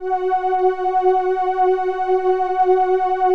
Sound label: LAMBDA, String Machines